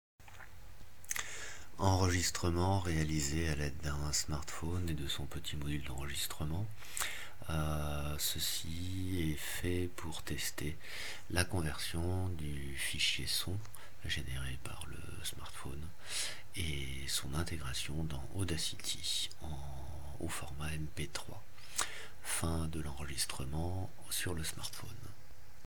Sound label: Vocal